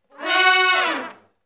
Hi Rip Up & Falloff (F#), Horncraft for R&B, Sections; Crimson Blue and Fabulous Horncraft 4 RnB